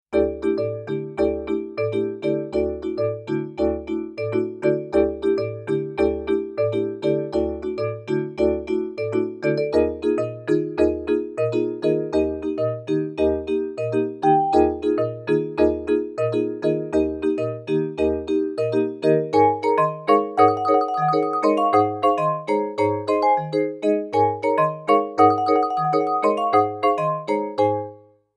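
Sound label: Vibraphone, pianino cyfrowe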